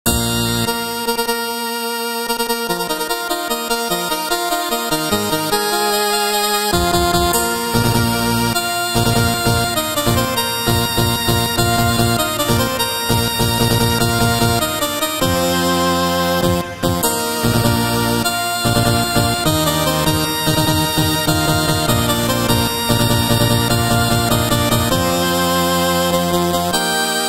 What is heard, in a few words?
Chiptune